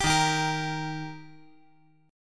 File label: Eb Major Eb down 5G,4C,5E,5A; strummed; JGuitar